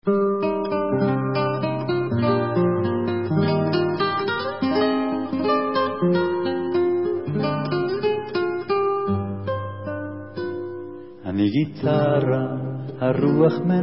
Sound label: Gitara